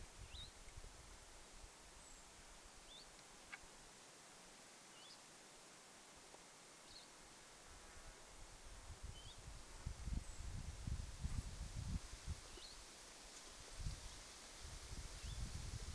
pajulintulintu aantelee

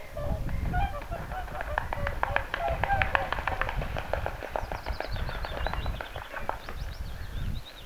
laulujoutsenia nousee ilmaan; laulujoutsenia nousee ilmaan, 2

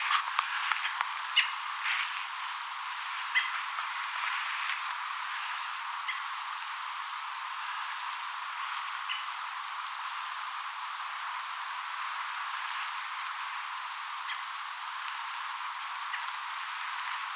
punakylkirastasparven aantelya; punakylkirastasparven ääniä, 1

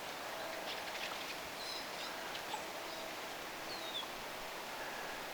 ilm nuoria vihervarpusia

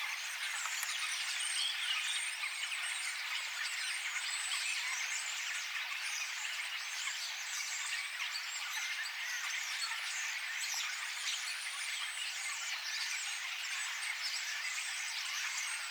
kottaraisparven kuorolaulua

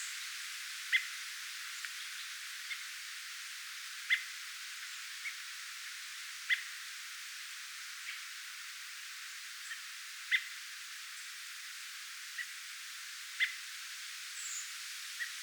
punakylkirastaita lintutornin lahella

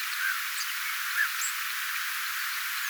mika laji onko se jokin kirvislaji ehkapa mika laji

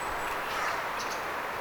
erikoinen varislinnun aani; onko tuo joku itainen naakka; onko se itainen varistyyppi

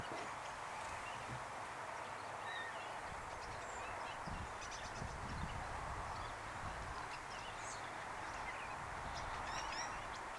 nuori kottarainen rastaiden aania

